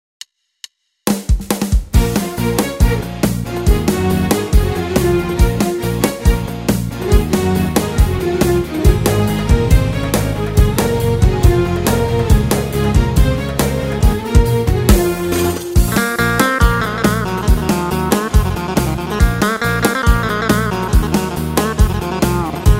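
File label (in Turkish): rack arabesk 4 telli, Tyros 4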